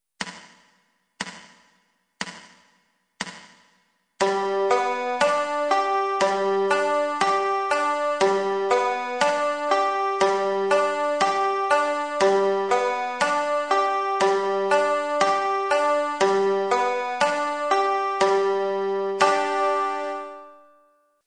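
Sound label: forward roll, banjo; roll pattens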